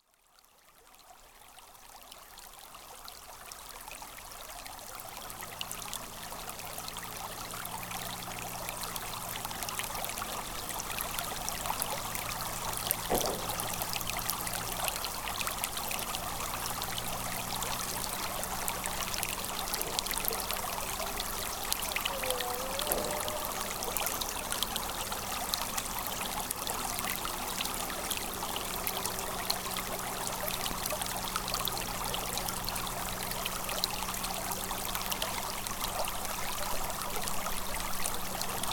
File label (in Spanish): rio quillahue, moquehue; neuquen